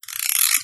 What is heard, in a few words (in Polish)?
zaba